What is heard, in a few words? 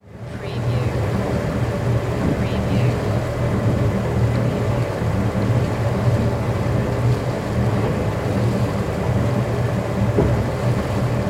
Boat Start Drive Slow; sound-effects-bible